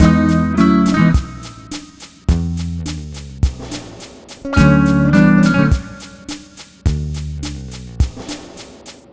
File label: Multi Guitar Bossa Mix-E, 105; Zero-G Kocktail Kollection; Construction Kitz (Lounge)